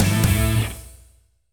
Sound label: Rock Quick Stinger